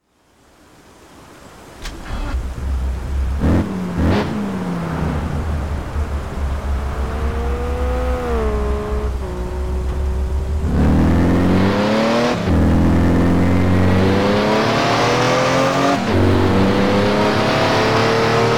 bmw m3 e46; 2006

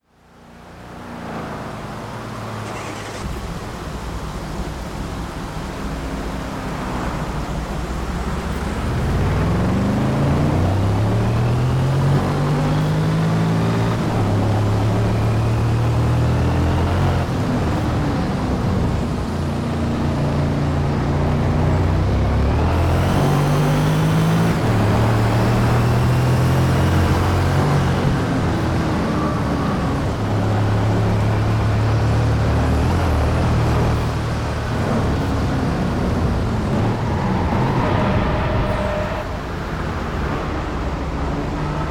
mercedes-benz slr mclaren c199; 2005; TDU-1

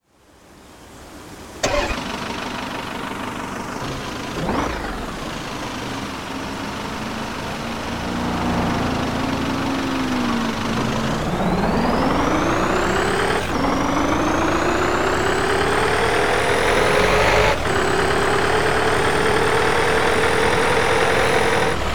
audi s6

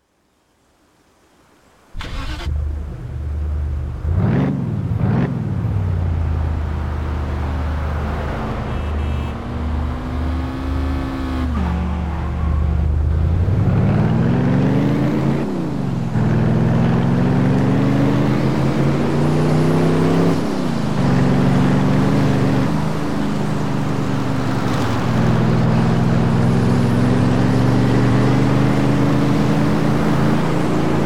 chevrolet cadillac 5-3 v8

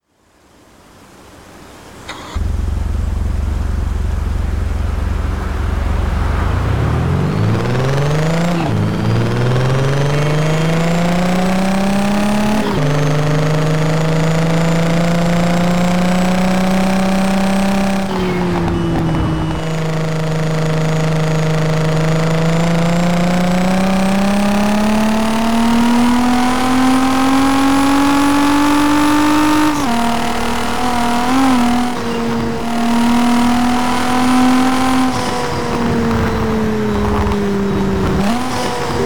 audi s4 tt bov; 2001; TDU

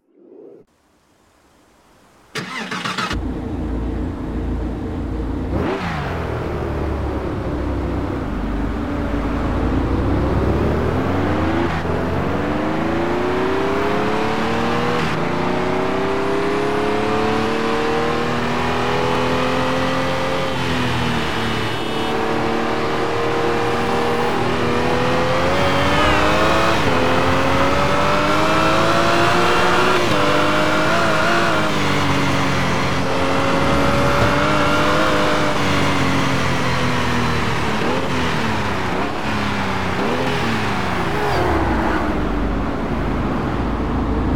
ferrari f612 scaglietti

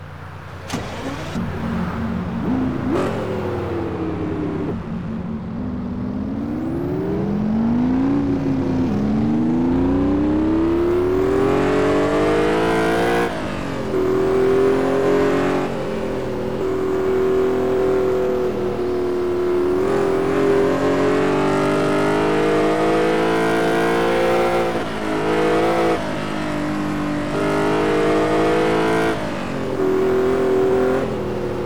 lamborghini miura p400 sv; TDU-1

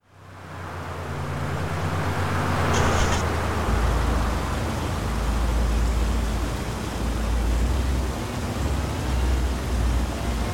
bentley continental gtc; 2019

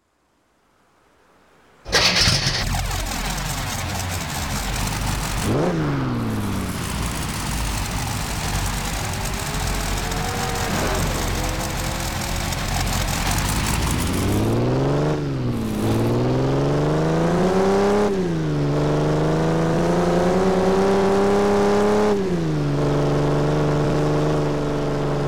dino 246 gts; TDU-1

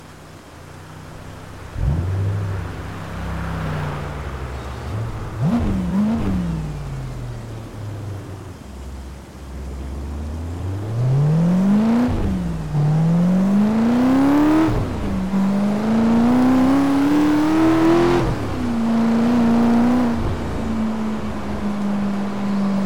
bmw m3 e92; 2008

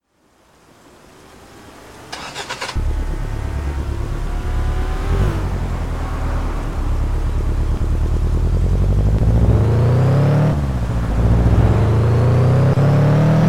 subaru impreza wrx sti gd 2000; TDU-1

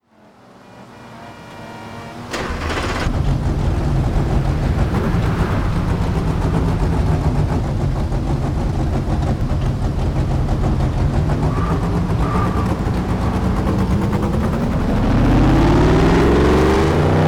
pontiac gto 1967; TDU-1

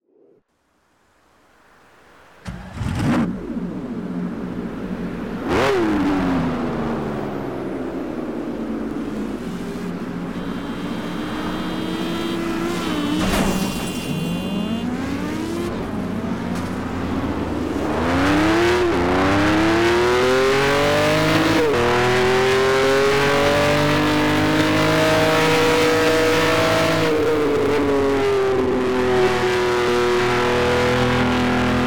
ferrari 330 p34; TDU-1

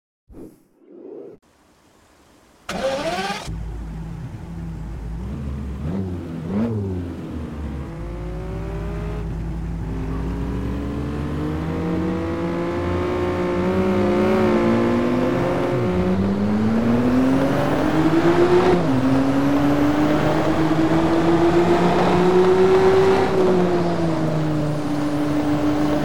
aston martin virage 2012; tdu

